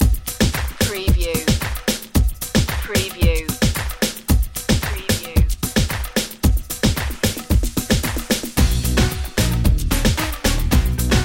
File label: street hop holla